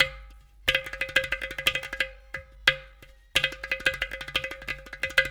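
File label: Ghatam, World Groove; Ambient Grooves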